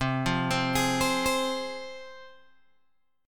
C Suspended 4th Sharp 5th, C, down, Guitar, Open G